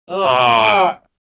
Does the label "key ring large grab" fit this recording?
No